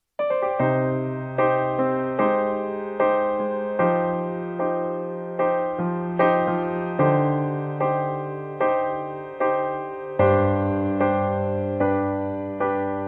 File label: New Mobile Ringtone; ringtones